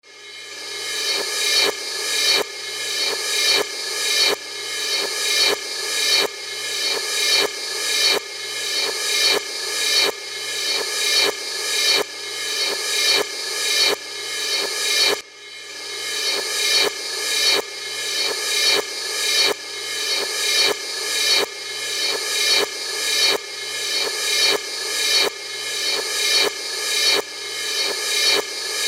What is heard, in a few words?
Repetitive Cymbal; Stroke